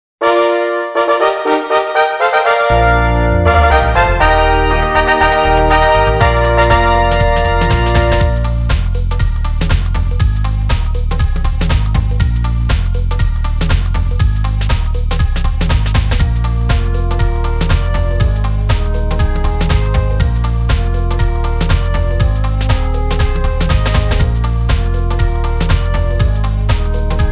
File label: Victory Fanfare; astronoka original soundtrack